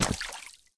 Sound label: splash